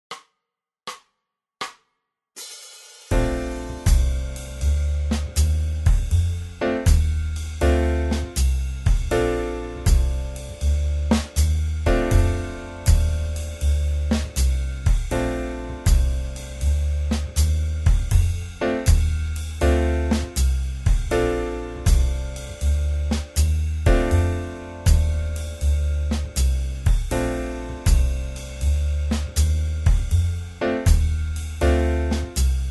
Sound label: Scale; Tomosax